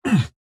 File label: AHurt